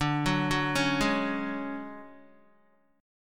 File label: D Major 7th Flat 5th D down Guitar 4F 4A 5C 5G 5C 6E; arpeggiated; JGuitar